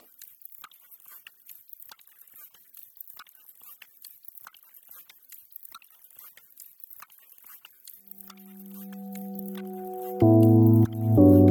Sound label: Ambience